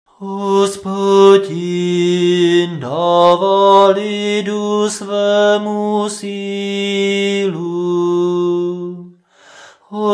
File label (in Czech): Nedelni prokimen hlasu